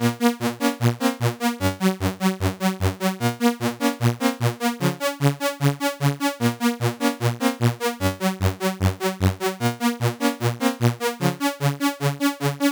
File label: c64 in game; lazy jones